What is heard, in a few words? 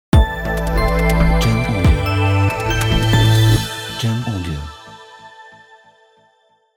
Corporate Tech Ident